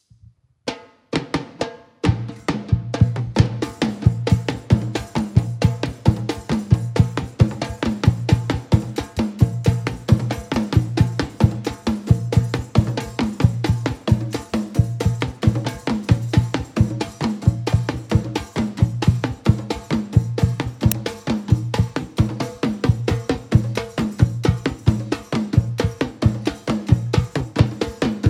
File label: Percussion Jam; Coke Studio; Best of Coke Studio